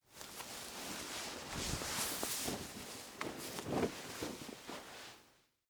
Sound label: Suit Coat Take Off, Foley, Clothes, Suit, Coat, Take, Off; Dressing; Human